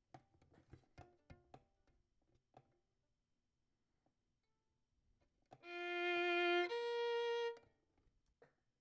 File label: Violin; Verdigris Music